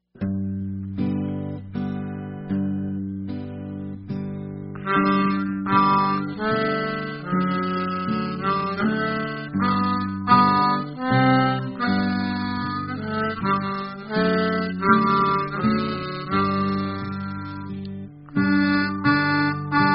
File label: Am guitar; Pulmonica